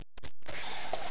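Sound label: jar open, household; Image Bonanza; Expert Software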